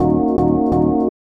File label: house synth 125 A, keys